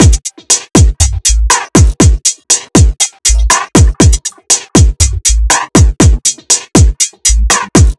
Robot Groove Beat; Sonic Foundry; The Electronic Music Manuscript Collection; Drum Loop Structures

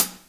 hh hit, HAT, Drum Kits; Big Fish Audio - DOM; Redrum

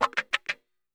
WAH KA CHAK, Bizarre Guitar, WEIRD WAH; Spectrasonics

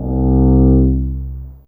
Vlo Short C2, HiString

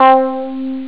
lead synth; Amiga Soundtracker Sample Packs

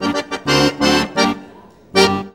ACCORD HITS; Best Service; World Colours

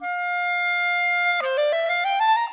Clarinet; Sonic Foundry (Sony Creative Software); Orchestral VOL-2; Modern 29

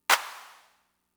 Clap, Fricke MFB-522, Drum Machines

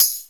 TAM

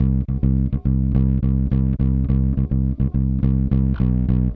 Clinical Bass

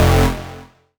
Bass F, Bass Synth

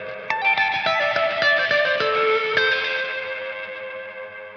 Tele Dub, 105bpm Guitar Dub; dub designer

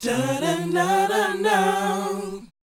BOYZ, Vocal, R&B Dance

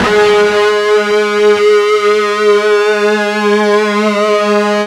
FUZZ G#2, Guitar; East West; Steve Stevens Guitar Samples Collection

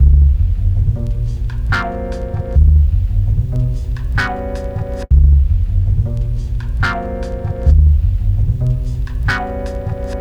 Dusty Melody

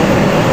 Jet, mt-32

